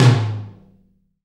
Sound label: TOM TOM, Roland